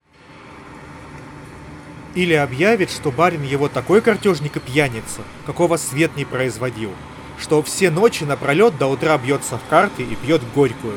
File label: Recorder noise; honor choice earbuds clip